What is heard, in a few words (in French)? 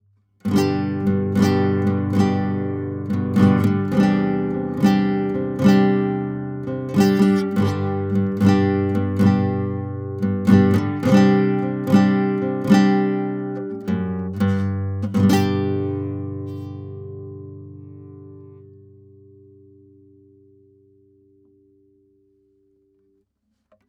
Guitare Filtre coupe bas active Proche; SparkSL